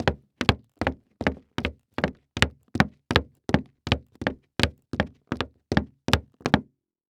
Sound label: Foley Footstep Hard Sole Dress Shoes Wood Surface Fast Walking; Foley Footsteps